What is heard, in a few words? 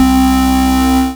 Synths C; Vengeance Samples